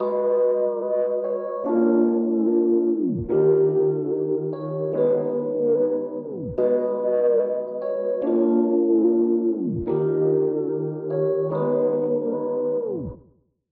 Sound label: MELODY 146; BWB VAULT